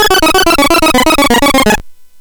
Sweep, CSG8580R5

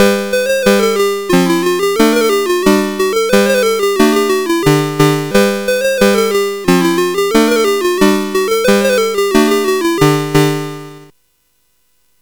sid CSG8580R5, GAMES; Cavelon

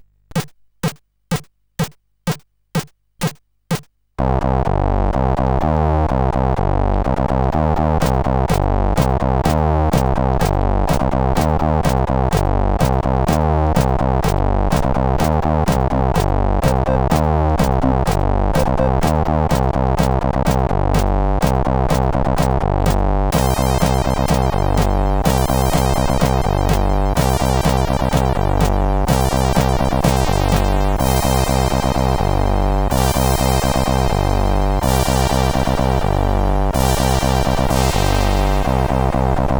Disco Fever, CSG8580R5

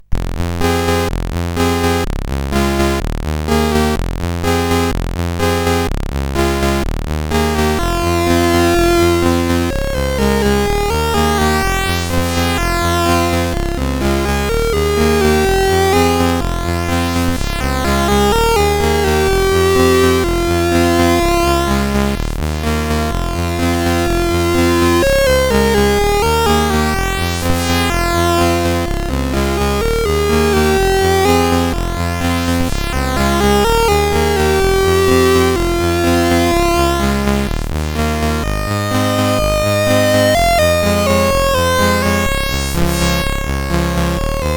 sid CSG8580R5; NWCUG Demo 3

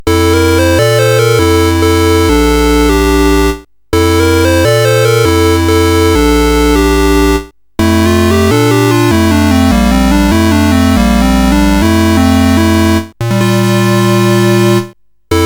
sid CSG8580R5; Nine Princes in Amber

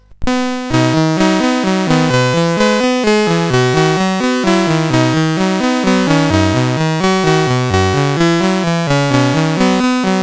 sid CSG8580R5, GAMES; Electro World

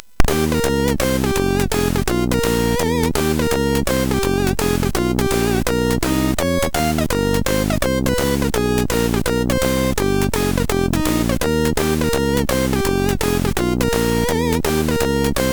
Jazz, MOS6581; MOS6581R4; hvsc

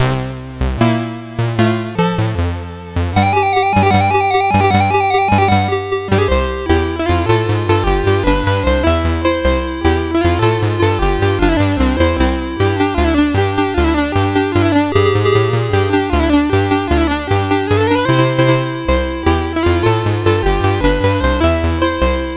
Paddle Mania, CSG8580R5

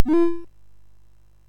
Dragons Den, MOS6581R3

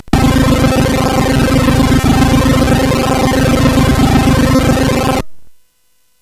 Deviants, CSG8580R5

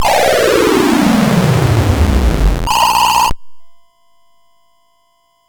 Dragonworld sid MOS6581R4

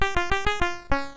Japanese Koto MOS6581R2

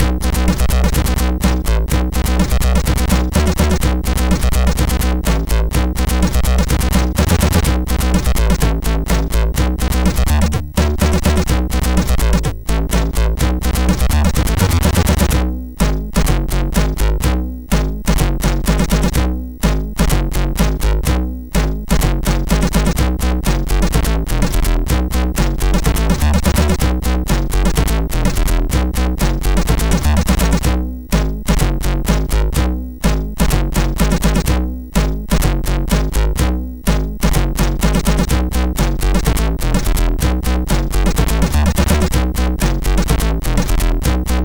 Funky Bass, MOS6581R3

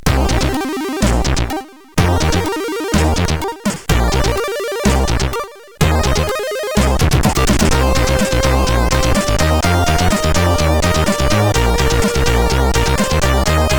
remix, CSG8580R5; Vagabond

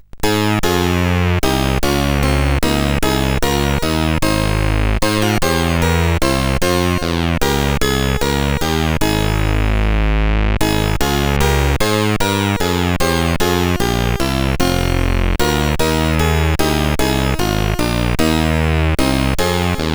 Bach CSG8580R5

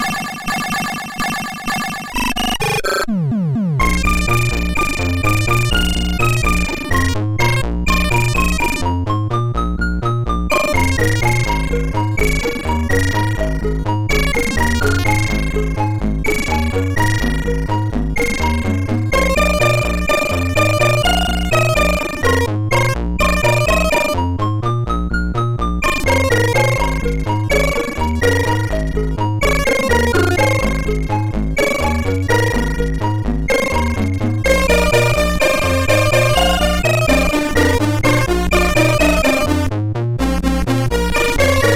Harmonic, MOS6581R4

